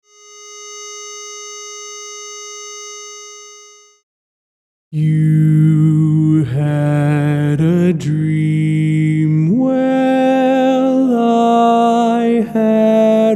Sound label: Lead